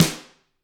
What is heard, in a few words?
GRETSCH SNR, Double Platinum Drums